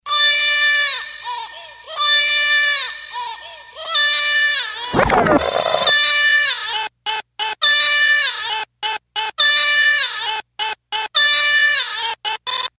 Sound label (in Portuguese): bebe fantasma chorando